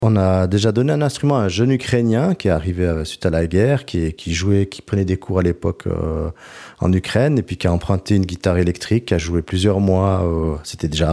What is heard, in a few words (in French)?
bibliotheque instruments midi